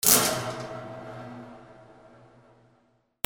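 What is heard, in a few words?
percussion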